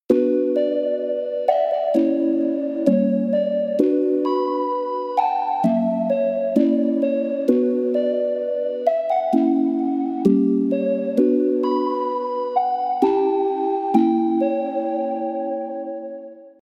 PLUCK

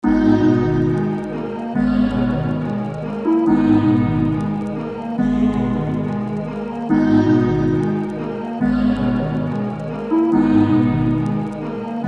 Melody Loop

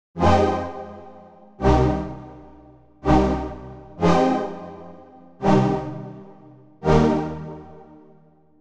LEAD Brassy

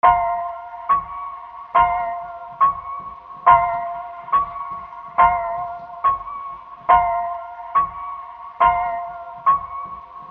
Flex Melody Loop

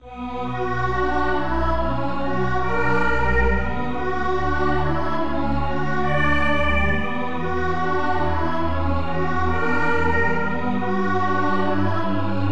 Drill

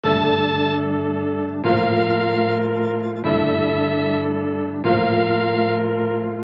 Melodic Loops

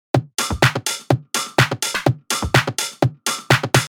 Full Drum Loop; Unit Rcrds

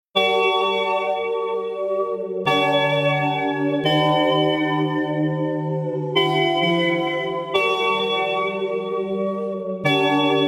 KEYS

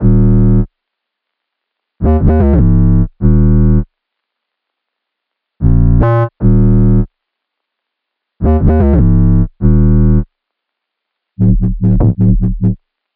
Drill

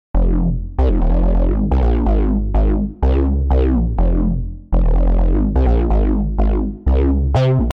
Bass Loop F min; Unit Rcrds

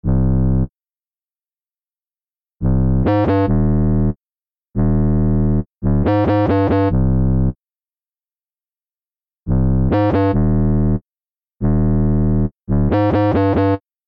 Luxury Sample

